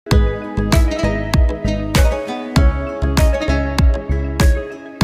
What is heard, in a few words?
love song instrumental remix music, turkishmusic; zehra gunes; turkishactress